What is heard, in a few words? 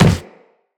Operator Walk Wood, Footsteps